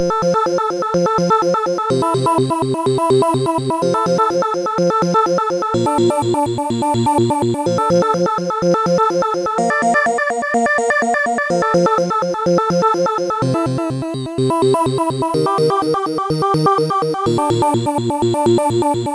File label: organ shorties; MusicLine